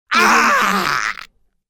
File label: male killing pain shout